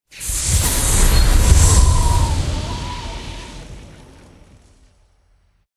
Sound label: Dust Storm; Fate The Cursed King; SPELLS